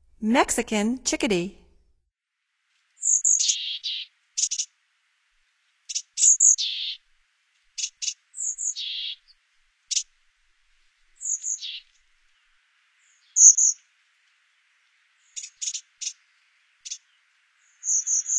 Mexican Chickadee, Songs of Birds of North America; Stokes